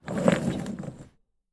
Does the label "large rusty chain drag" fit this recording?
Yes